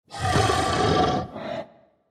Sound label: hiss; sporecarrier